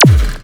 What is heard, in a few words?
mix, Production Effects; Best Service - XXL Production Effects